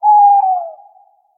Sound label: Tawny Owl; SyrinScape